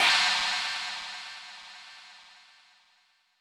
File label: Roland U220 Electric Set, Drum Machines